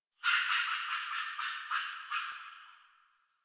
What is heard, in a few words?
Tree Critters Happy Calm; Warframe; Duviri